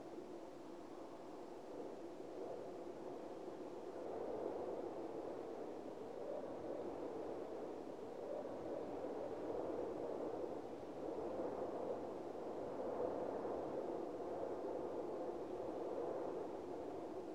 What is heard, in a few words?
Wind Very Gentle; Super Crazy Rhythm Castle; PS4; Xbox Series X|S